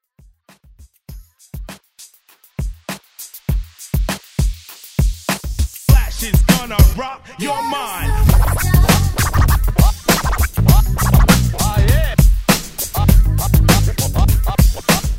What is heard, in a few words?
Old School Redrum